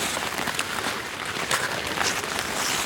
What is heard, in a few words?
Scrape Glace Loop